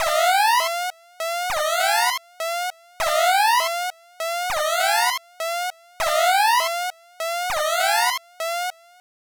Alert; silver grapple original soundtrack 2017

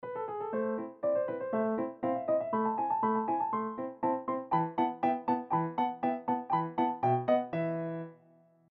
Piano Home piano; Piano app by Yokee

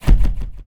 Menu Fail Error

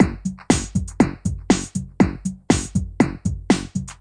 Loading Loop; de Blob 2

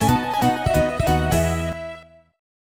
Round Clear

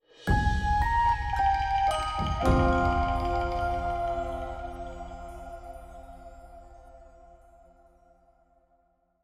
complete Quest Stinger F

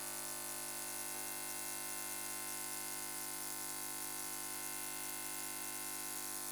Ambient Electrical; Among Us

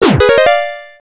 Coin

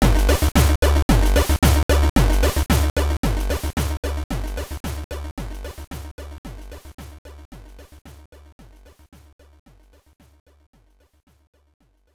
Dance Neutral; Shantae; GB

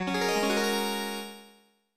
Small Item; Alcahest

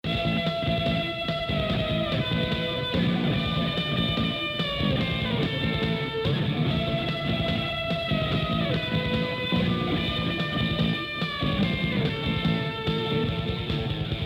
Hardrock Radio; Luigi's Mansion 3; E.Gadd Selection

